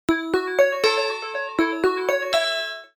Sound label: Bell